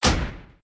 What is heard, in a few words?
open